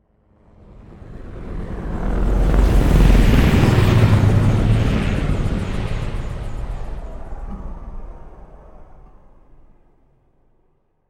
Drone On Passage

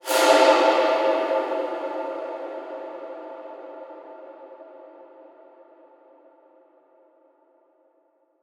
Small Swoosh

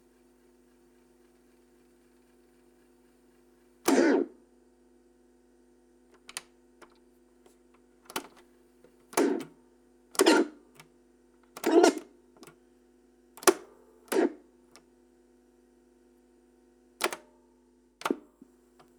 Tape Stop Effect

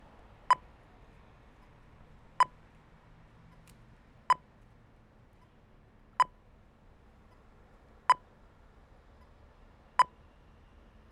New Zealand Pedestrian Traffic Signal

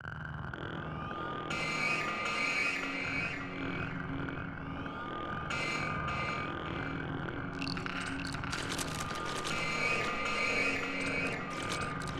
Spooky Ambience; Apollo

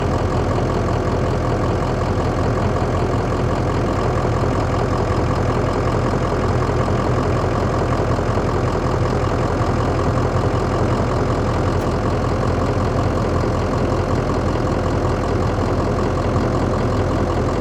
Diesel Idle

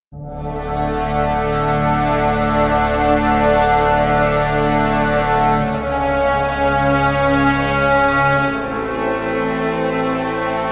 Brass Majestic Cinematic Theme

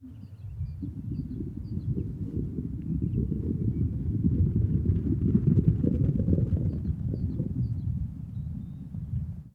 Sheep Running R L

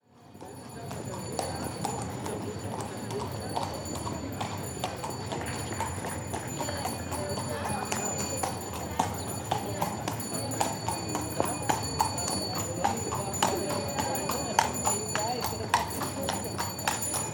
Horse

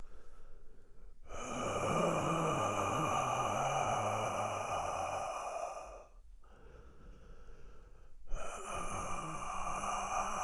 Breathing

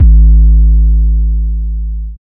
808 Superbass; Serum

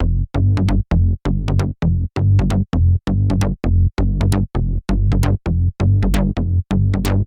132 Bpm Detroit Bass Sequence

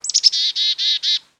Black Capped Chickadee Bird Call

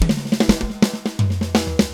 Drum Fill

